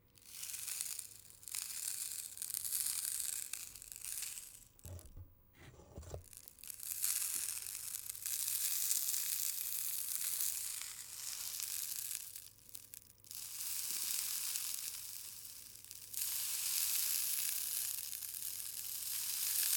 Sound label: Sand Falling On Paper